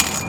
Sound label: Chainlink Fence Perc, percussion; Lindale; HOUSTON